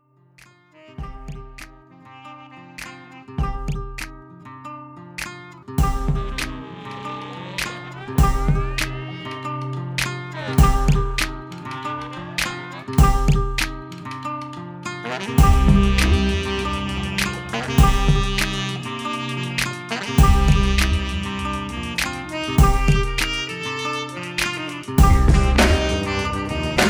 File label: sax; chill/downtempo